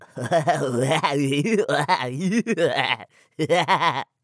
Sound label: Goblin Creature